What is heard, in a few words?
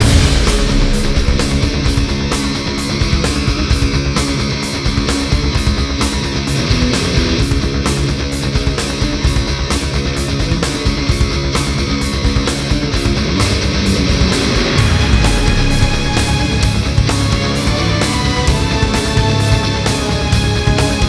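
IACON INVASION GUITAR, soundtrack; transformers war for cybertron gamerip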